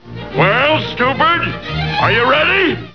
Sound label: bears; LOONEY